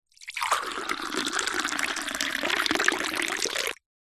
drink water pour into tumbler drinking glass from slight height